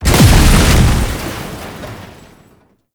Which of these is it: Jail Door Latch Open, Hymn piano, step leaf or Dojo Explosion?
Dojo Explosion